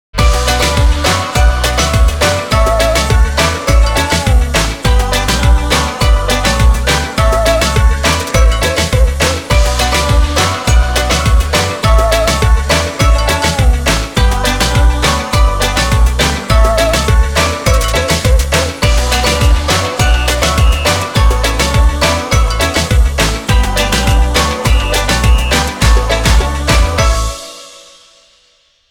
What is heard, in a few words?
DANCE CRAZY (INSTRUMENTAL)